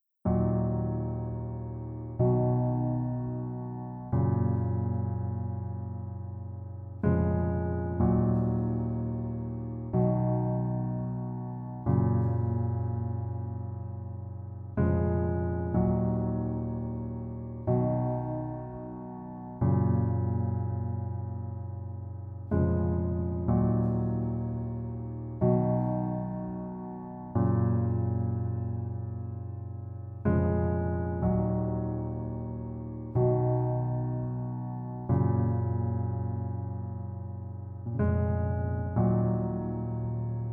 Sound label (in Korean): Piano ver 남자키